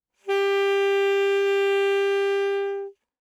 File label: Alto Sax No Vib ff G4, Eb Alto Saxophone; Woodwinds; MIS Pitches - 2014